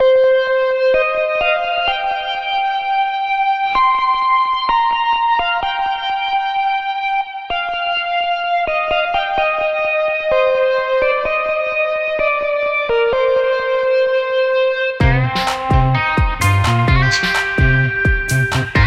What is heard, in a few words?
Western music cool and up tension